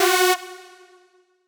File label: synth